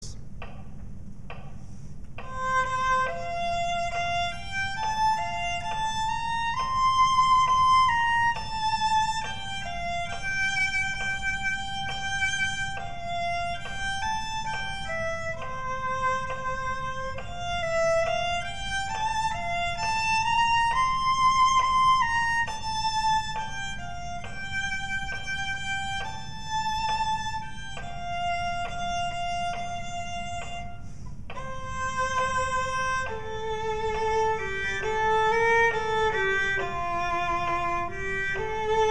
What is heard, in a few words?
slow violin; simple gifts